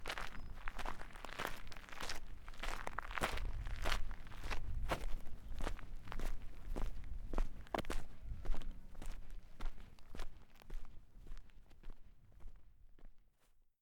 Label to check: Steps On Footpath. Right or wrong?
Right